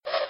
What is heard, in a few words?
bow